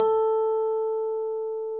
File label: RHODES A3; Sonic Reality - Vintage Timetraveler